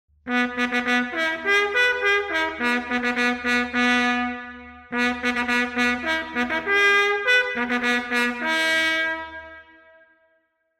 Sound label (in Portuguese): Sentido Unidade, toques